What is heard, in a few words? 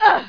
grunt; rashdemo